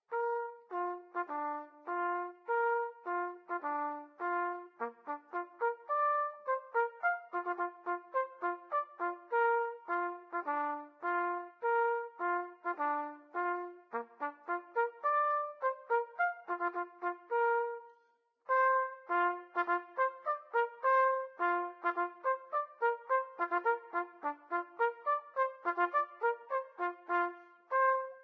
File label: Trumpet; Zomboid; Lifestyle